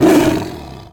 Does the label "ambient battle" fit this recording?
No